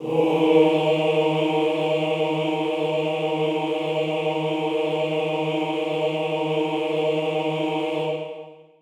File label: chorus male d#3; Sonatina Symphonic Orchestra